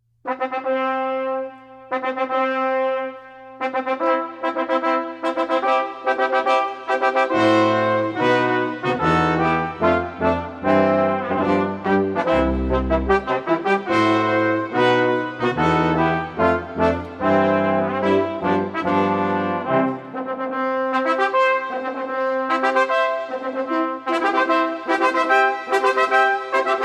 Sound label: Brass Quintet Wedding March; Felix Mendelssohn